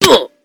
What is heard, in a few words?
Minecraft Hurt; titan melee hit 3p vs 1p